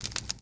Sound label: fire pop; Tony Hawk's Project 8; XBOX